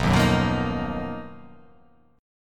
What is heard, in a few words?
B Major Sharp 11th